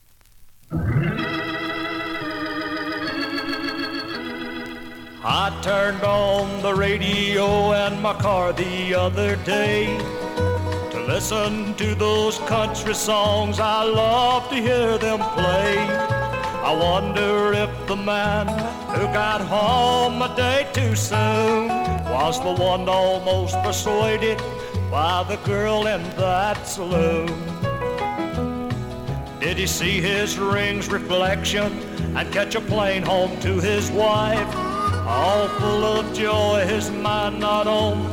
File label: Country music